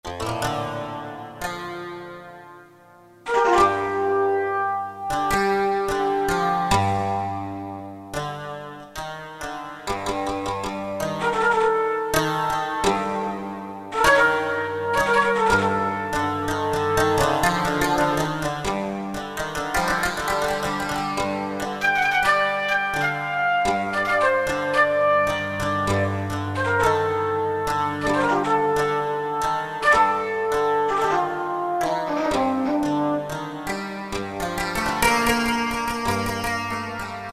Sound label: Koto-Bamboo Flute, Roland PN-D50-04